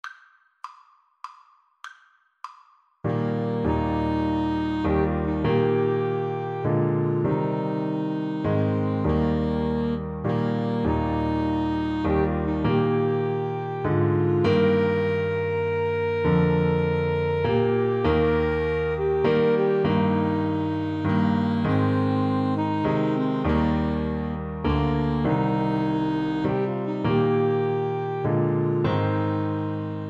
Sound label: Piano Trio